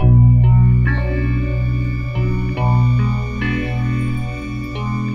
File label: guitar dark low, Loops, Sad